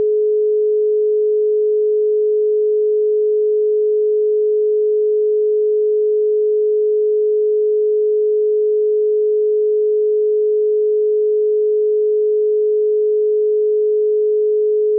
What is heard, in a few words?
dial; Nokia Lumia-900; Lumia 900 AT&T